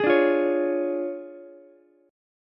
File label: D Minor Major 7th Flat 5th D Ukulele; DmM7b5; strummed; JGuitar